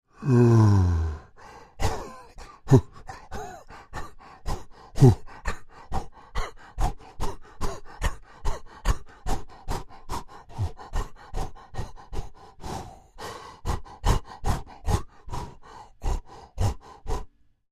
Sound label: Creature Breaths Active, Human; Breathing; Creature,Human,Breaths,Active